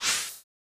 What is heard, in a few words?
Retreat